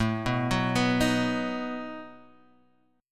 B Major A

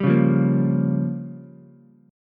G Minor 7th Flat 5th, Ukulele; Gm7b5 strummed; 0,1,1,1; JGuitar

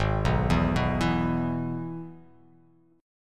Minor Sharp 5th; Gsharp; 4,2,2,4,4,x,x,x

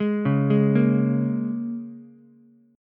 A sharp Minor 7th A sharp Ukulele, chord; arpeggiated; 1,1,4,1

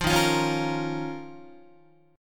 D sharp 7th Suspended 2nd, Guitar; D#7sus2 strummed; JGuitar

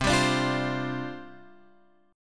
C Suspended 2nd Suspended 4th C Guitar Open F; Csus2sus4 strummed

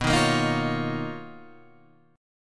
B Major Sharp 11th, Guitar, Standard; BM#11; strummed; JGuitar

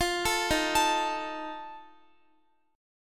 Eb Suspended 2nd Flat 5th, Guitar, chord; Ebsus2b5 arpeggiated; JGuitar

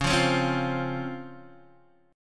C sharp Major 7th C sharp Guitar DADGAD

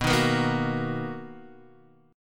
B Major 7th B down Guitar DADGAD

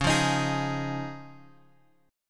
Db Major Add 9th, Guitar Open G

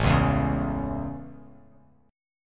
A Major, Bass Standard; strummed

